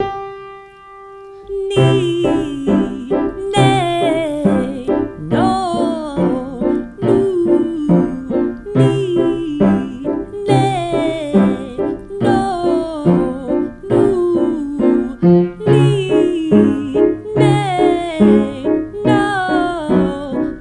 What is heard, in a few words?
Descending 5th Run, warm ups